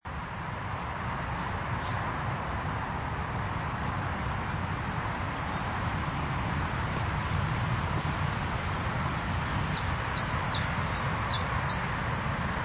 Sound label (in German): iberischer zilpzalp